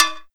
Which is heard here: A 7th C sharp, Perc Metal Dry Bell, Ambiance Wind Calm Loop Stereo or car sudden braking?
Perc Metal Dry Bell